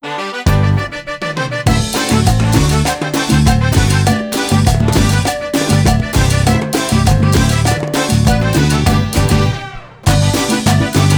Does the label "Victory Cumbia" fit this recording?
Yes